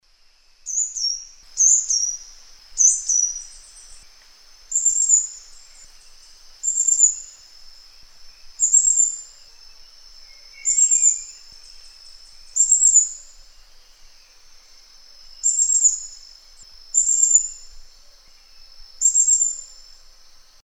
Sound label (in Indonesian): serindit filipina